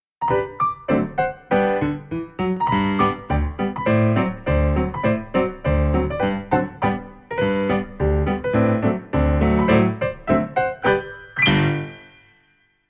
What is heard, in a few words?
Rhythm Exercise Music Only